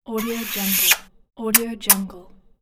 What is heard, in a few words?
kiss